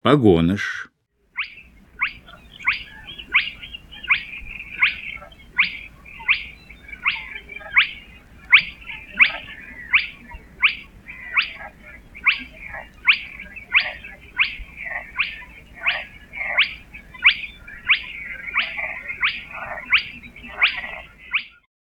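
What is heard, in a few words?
crake; Golosa ptic i zverei; Pogonish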